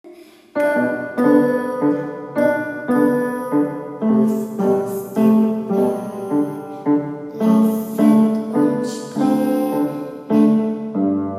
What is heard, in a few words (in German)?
Kuckuck